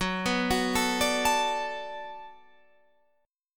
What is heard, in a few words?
D 6th Gb, chord